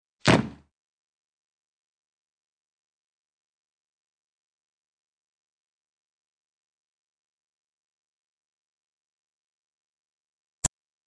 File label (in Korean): Fights, 싸움-전투; 헐리웃특수효과음